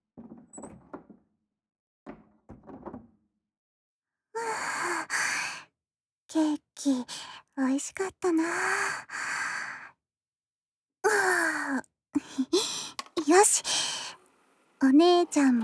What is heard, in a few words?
breakcore BGM volume up